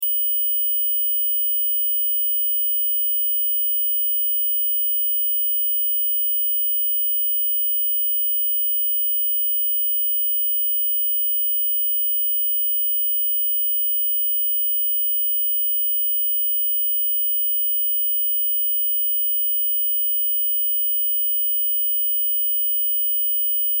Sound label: Test Tone Square; Wave 15Khz 60 Secs -18Db Loop